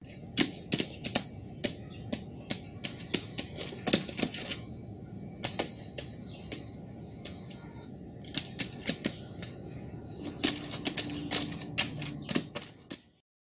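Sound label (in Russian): когти птицы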